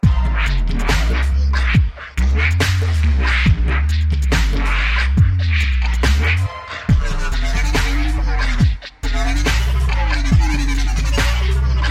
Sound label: DUBSTEP